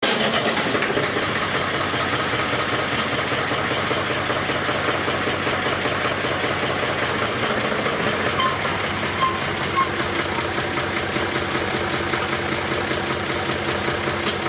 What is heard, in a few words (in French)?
demarrage du tracteur; le lexique des moyens de transport